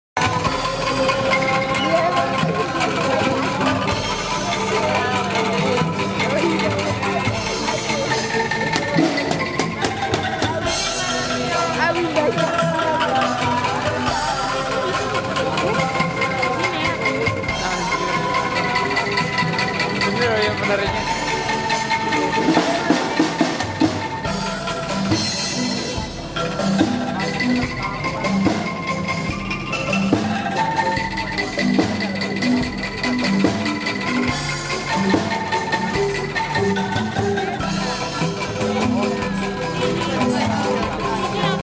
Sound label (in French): musique traditionnelle indonesienne; yogyakarta